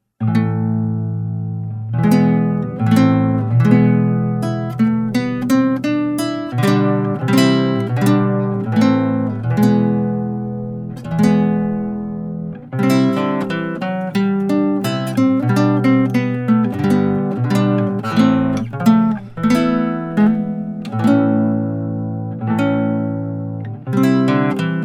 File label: Pavan